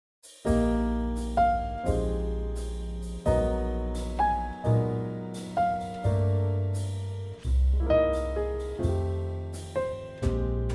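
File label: E flat trio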